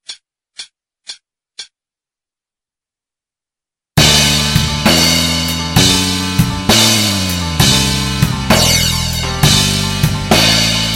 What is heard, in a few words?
solo backing, guitar